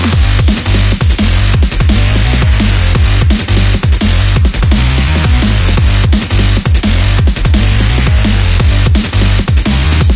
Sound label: cannibalboss loop